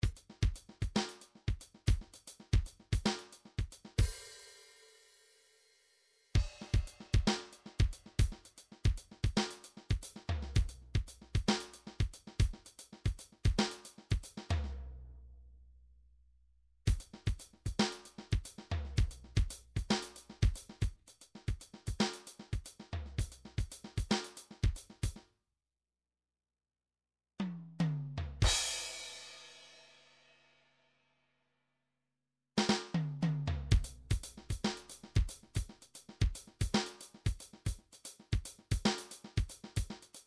midi drums

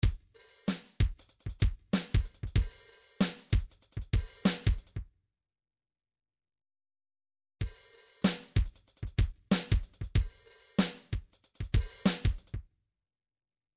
drums, midi; Paul Kodish Dangerous Drums

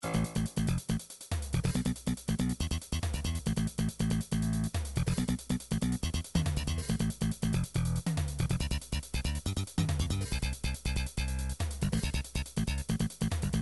bass, midi; Song Book

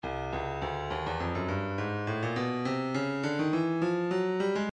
breakbeat 103 bpm; maximus c; Stylus Breakbeat Midi Files

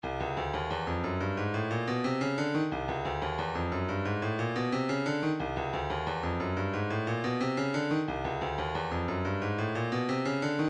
165 hihat

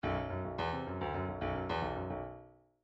bdf midi file grooves funk n funk n

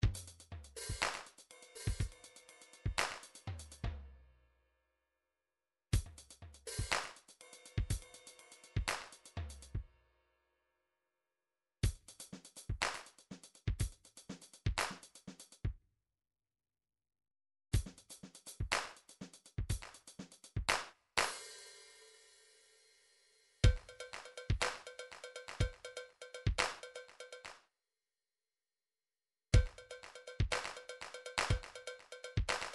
midi drums

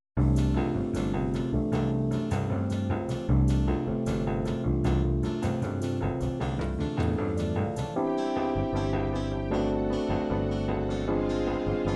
bossa nova, midi

